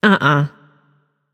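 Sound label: disagree, female voice; smite; voicepack; hera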